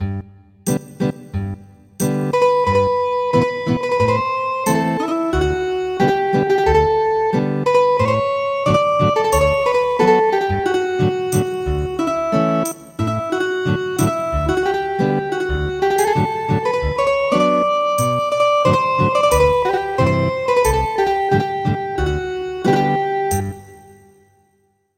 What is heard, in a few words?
Ethnic Guitar, midi traditional; You Our Ancient Land